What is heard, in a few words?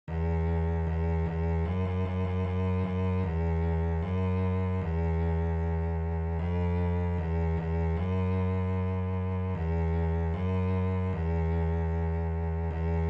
E string 2 Notes, Bass; Bass for Camp